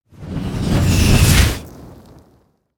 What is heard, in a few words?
Fire Power Drain; Spinjitsu